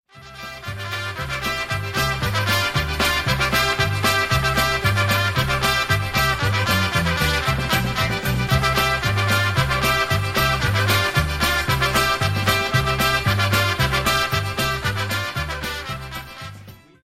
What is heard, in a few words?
Polka